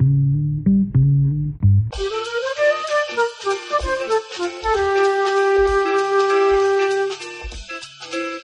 flute, Loops, Phonky

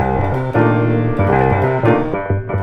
piano; Phonky

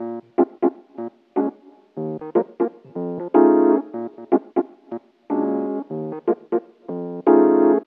keys electic, loops, phonky

